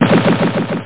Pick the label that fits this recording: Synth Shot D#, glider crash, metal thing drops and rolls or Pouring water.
glider crash